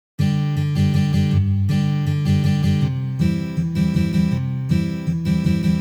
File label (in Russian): Boj Kantri na gitare